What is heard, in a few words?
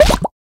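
hit liquid bubble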